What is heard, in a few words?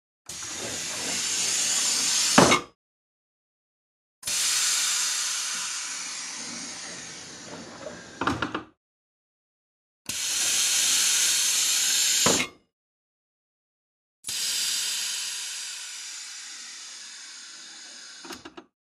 Hydraulic door